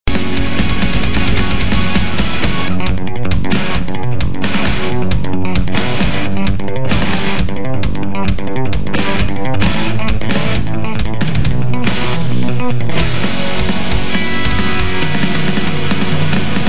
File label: Metr bass